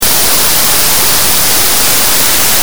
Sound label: woods creek